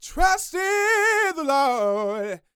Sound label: Vocal Gospel; Vocal Planet Vol1 Gospel